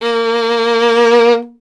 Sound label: STR VLN, Vln Detache; Miroslav Vitous